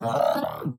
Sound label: FUN MONSTER Droid robot scream; Droid-1